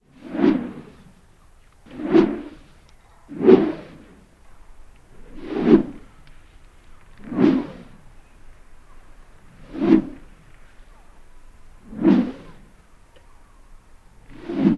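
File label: zvuki vzmaha, swing whoosh sounds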